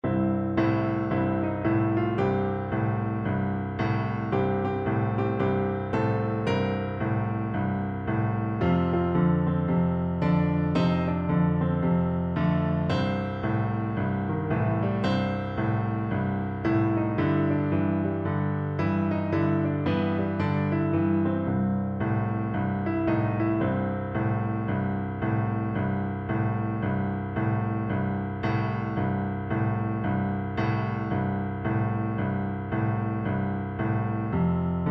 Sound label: Blues, piano